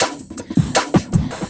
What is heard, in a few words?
Loop, Drum & Bass, 160 BPM